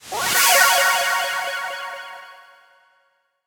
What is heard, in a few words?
ui level up; sonniss gdc